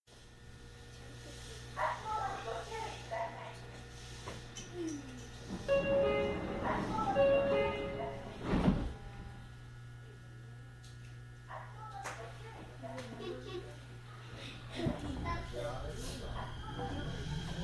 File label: train; 4001F下り急行列車 瀬戸市役所前発車後; 瀬戸電